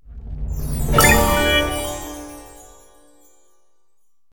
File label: candy Win Reveal Medium; fairyhansel